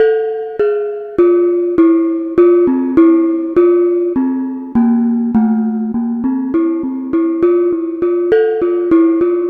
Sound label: Pelog Unison Bonang; Melodic; Ambient-Melodics-II